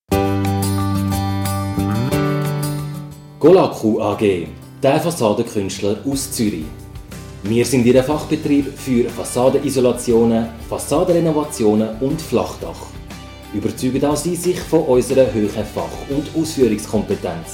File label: Jingle